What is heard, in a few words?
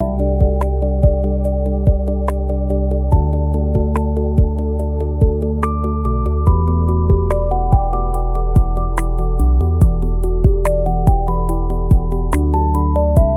loop; bgm